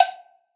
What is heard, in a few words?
cowbell second line deep; Percs